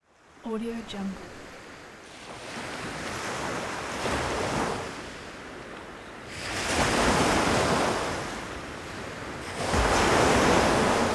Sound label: sea waves